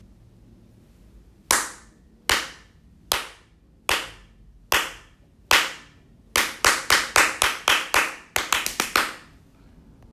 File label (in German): klatschen nachher; MAKUSTIK Hörerlebnis